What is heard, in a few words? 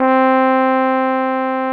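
tuba C4 stereo; TUBA +CORNET; Greytsounds VOL-1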